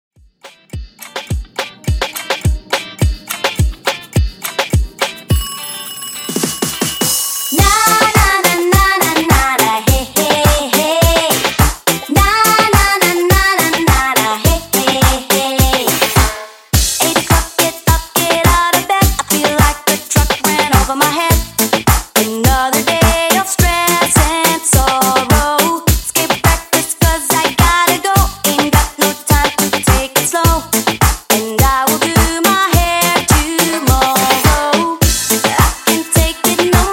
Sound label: Pop Dancehall 105 Bpm